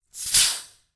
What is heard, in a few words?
food drink soda bottle open fizz close up; zapsplat